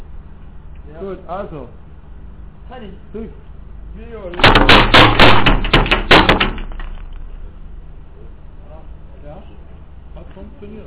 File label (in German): Fallschnur